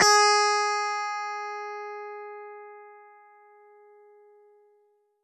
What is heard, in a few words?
g sharp, guitar